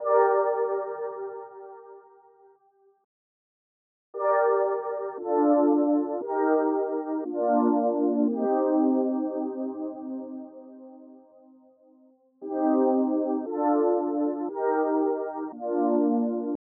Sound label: 116 BPM G Min Synth; Cymatics; 2022 Melody USB Expansion; Needs